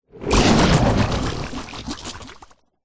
cartoon slime big growling slimy bubbling

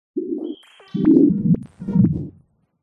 synth space weird synthy sci fi warble; Jessey Drake

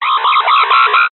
science fiction radio signal interference burst

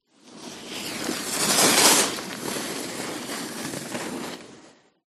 Ski By

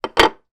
industrial small tile place down on hard surface

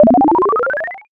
game one up extra life

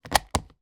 household mains plug into expander socket; zapsplat

